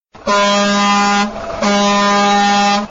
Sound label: Double Blast